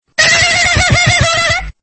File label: silent laught; torchlight